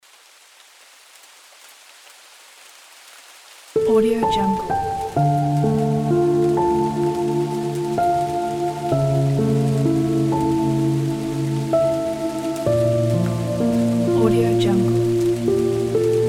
soft relaxing piano rain pack

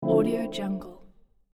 soft smooth ambient video game browse menu sound

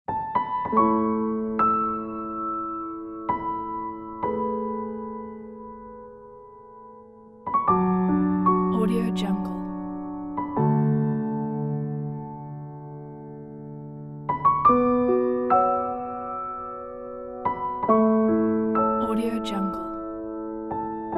childhood memories documentary piano

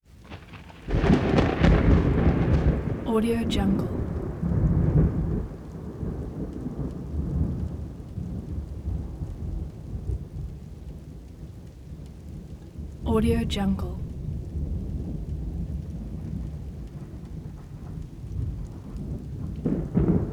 thunder claps constant booming strikes

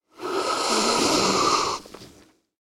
human sipping a coffee

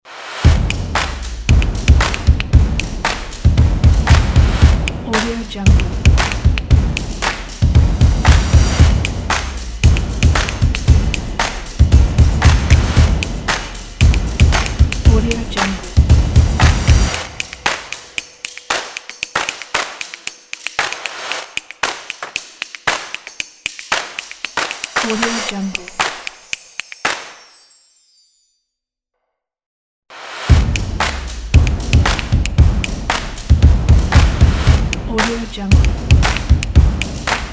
stomp and claps logo

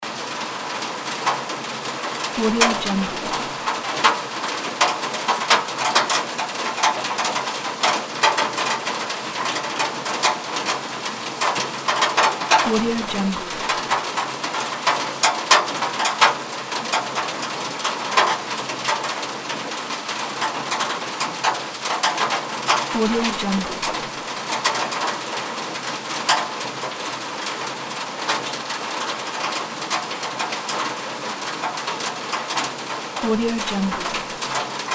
rain on roof loop

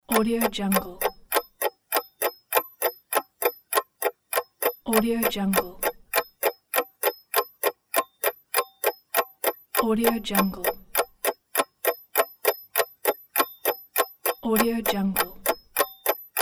clock

